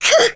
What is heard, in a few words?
voice tackle